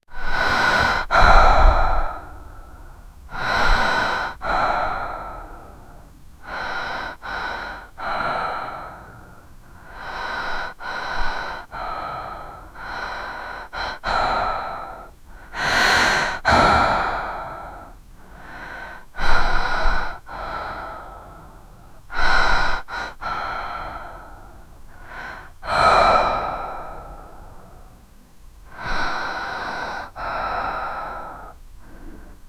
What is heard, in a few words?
female breathing slowly